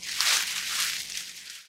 bush; casualtiesunknown

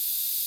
syringe Loop; casualtiesunknown

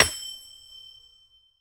crystal; casualtiesunknown

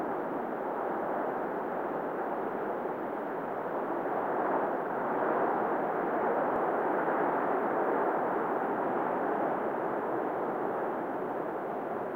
wind; casualtiesunknown

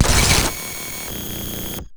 cut; casualtiesunknown

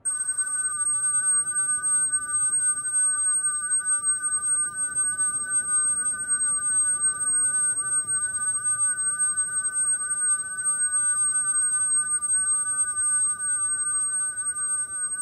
tinnitus; casualtiesunknown

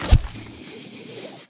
rope place; casualtiesunknown

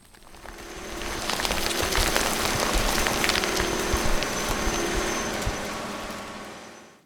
drill pod use; casualtiesunknown

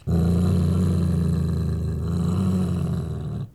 growl; casualtiesunknown

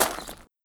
dirt; casualtiesunknown

